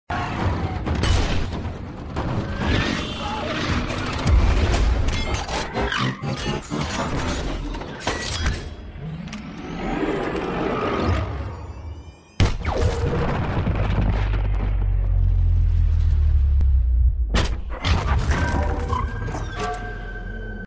3d New Age

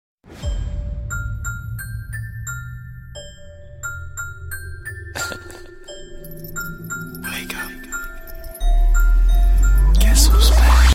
Go Faster

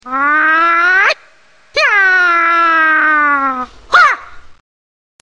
Fighting cry

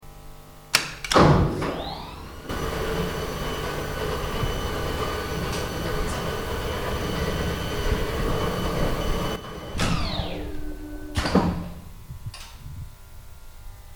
Elevator Motor; Pempi

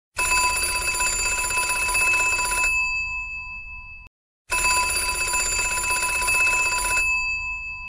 Phone Ringtone

